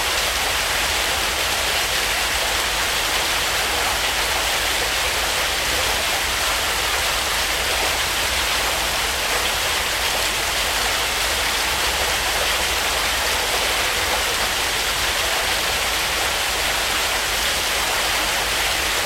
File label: waterfall mid; rp subterranean